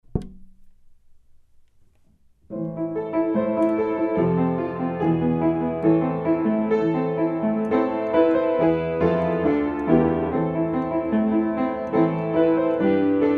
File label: piano